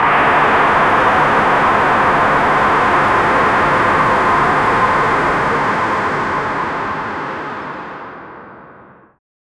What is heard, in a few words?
dystopian cyberpunk city